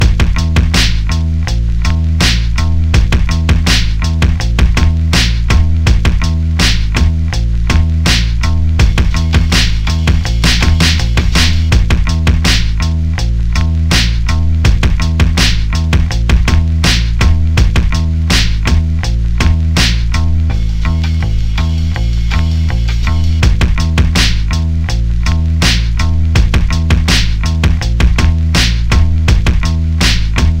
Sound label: cuts beats breaks samples stuff; threepeeoh